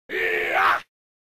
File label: Monster SFX